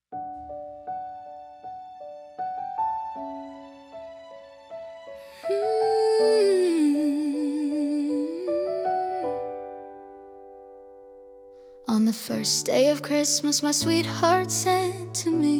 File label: Singing